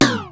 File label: FX, Drums